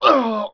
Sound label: player pain; PC Gamer IT CD 41; Daikatana